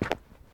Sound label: footsteps; smite; chaac